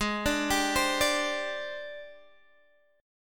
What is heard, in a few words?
Ab Major 7th Flat 5th Ab Guitar Standard; AbM7b5; x,11,12,12,13,10; arpeggiated; chordshape; JGuitar